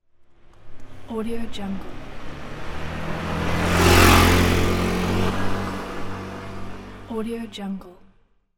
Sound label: fast and close motorbike driveby